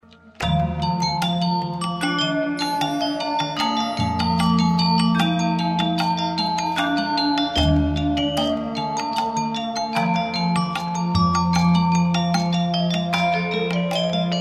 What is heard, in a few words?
Trance Dance